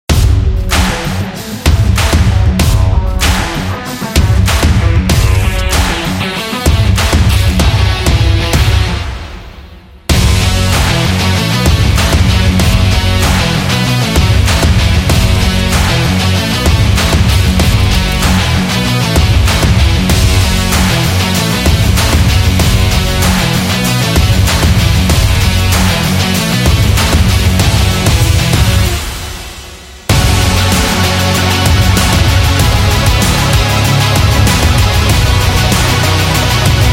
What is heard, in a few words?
Rock Action